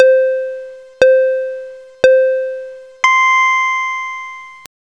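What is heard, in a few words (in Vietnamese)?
Âm thanh đếm ngược hết giờ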